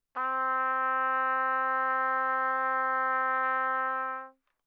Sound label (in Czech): trubka